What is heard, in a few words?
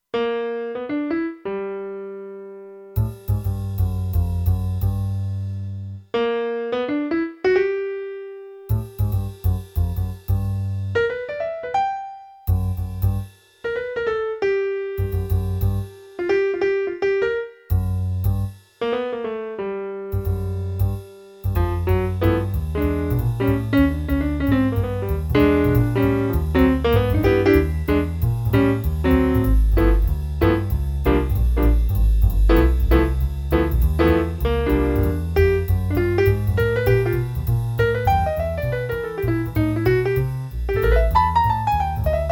Piano Trio, Kurzweil K2500X; Factory Programs